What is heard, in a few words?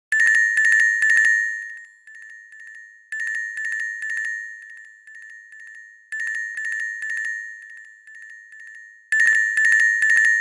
celesta, sony ericsson